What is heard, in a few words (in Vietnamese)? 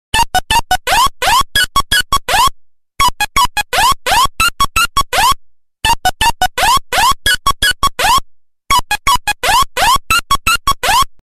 Nhac Chuong Nokia 1101